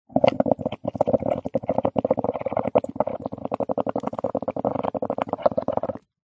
nature water blowing air bubbles in bowl of water foam